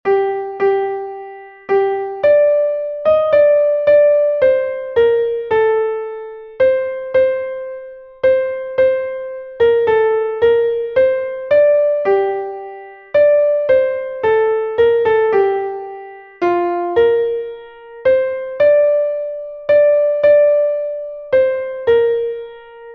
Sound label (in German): klavier; abschied der zugvoegel; melodiemeister